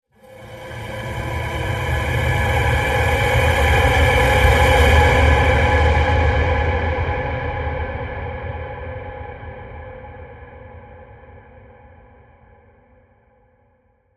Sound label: Outer Rim Space Transition